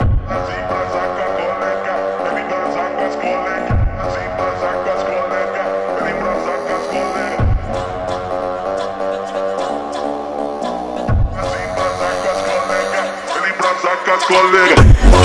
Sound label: Phonk Music; فانک phonk